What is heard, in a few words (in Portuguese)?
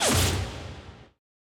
tiro teleguiado